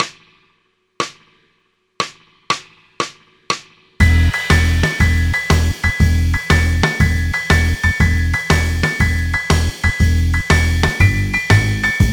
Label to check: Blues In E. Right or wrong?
Right